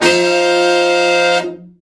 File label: BRA SECT; BRA SECTIONS